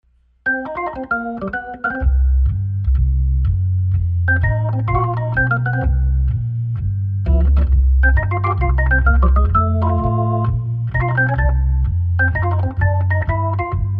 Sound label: 122xb Jazz Blues, hammond organ